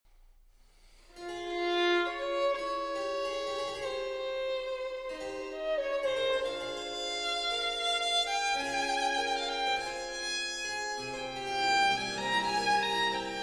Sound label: Violin Sonata in A Major, Adagio; Sonata op. 5 no 9